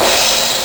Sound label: Linndrum, Nintendo Gameboy LSDJ